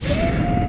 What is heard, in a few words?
servomotor